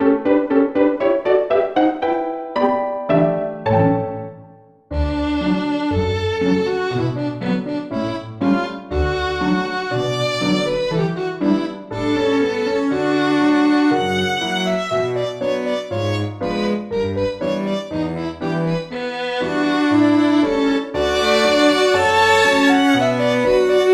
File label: Graceful Dance, synth